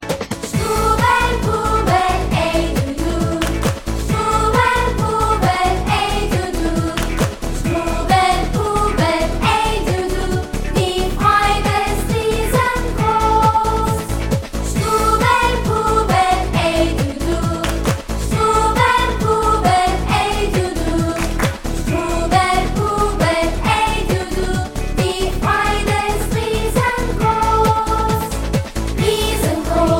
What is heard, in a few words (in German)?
Lied